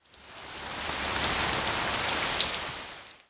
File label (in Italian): Acqua, natura